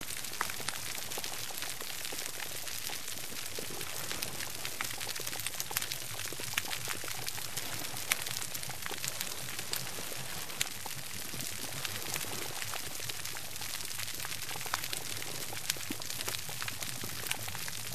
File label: healthy reef sounds; capricornia